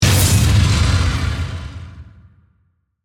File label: Mechanical Impact, Machine, Malfunction, Burst, Transforming, Robot; Imaging Element; Accent; Transition; Spacecraft; Production Element; BlastWave